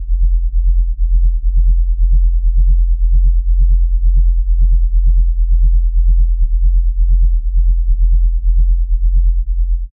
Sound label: a deep slow alien pulse; subsonic